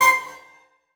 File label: Multimedia Internet CD-Rom Flash Beep Mechanical